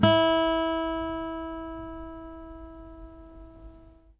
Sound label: ACSMEDPICK, Guitar; Quantum Leap; Guitar & Bass